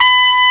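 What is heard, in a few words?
trumpet; CMI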